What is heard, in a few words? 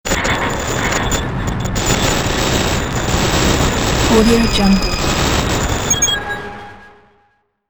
glitch noise sound